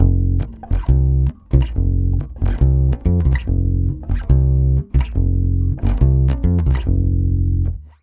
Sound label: 140 BASS F7, Brazil Bossa; USB Soundscan; AKAI